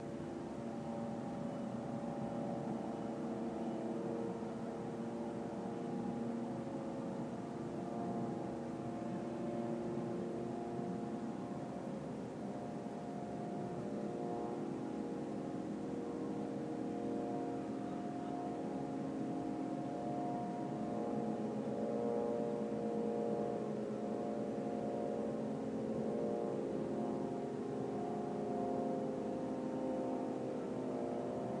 drones still flying at low altitudes, Gaza

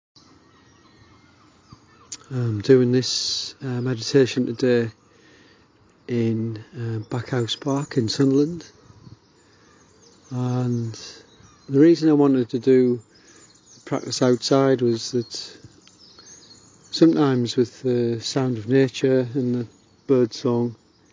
birdsong

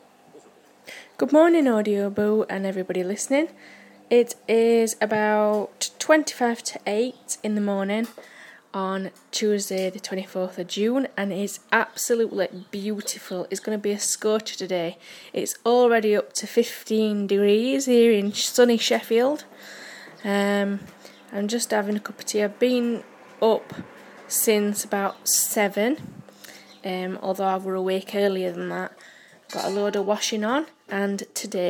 warm happy birthday

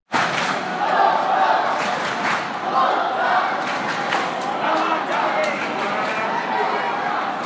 fans singing loud; great atmosphere at etihadstadiumau with afl westernbulldogs; loud and proud

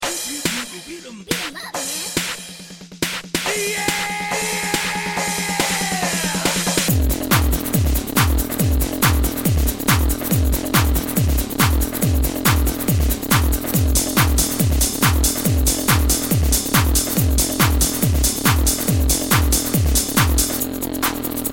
fusion techno mix

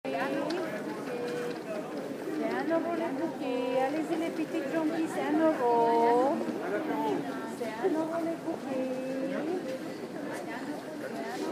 sunday morning paris marche; ordener

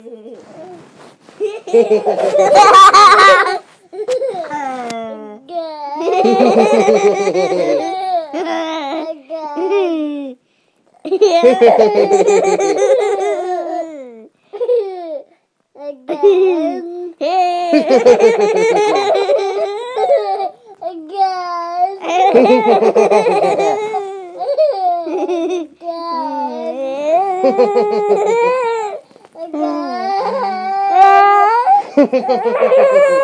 laff

